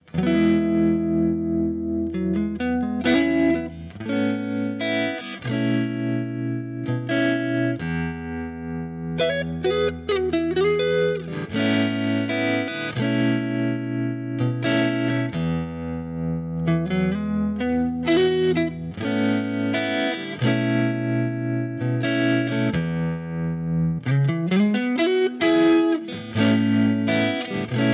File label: carl martin surf trem